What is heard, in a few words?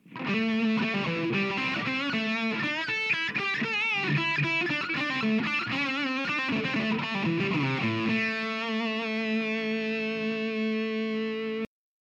les paul metal solo bridge; Harald König; Blue Note Gitarren Amps